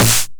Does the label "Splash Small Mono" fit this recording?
No